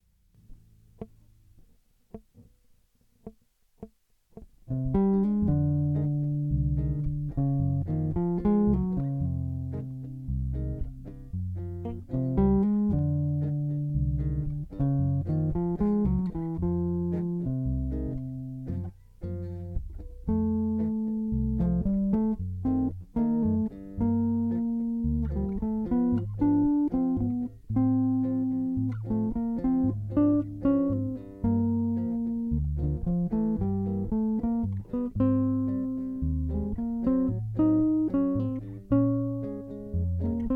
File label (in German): Bossa siebena Thema